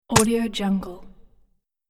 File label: world builder item drop